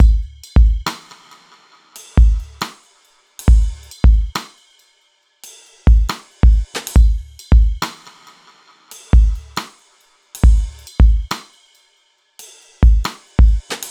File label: Bpm138 Tripster Break, dubstep drum loops